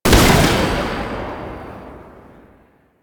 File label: Archgun Charged Shot; Warframe; Archwing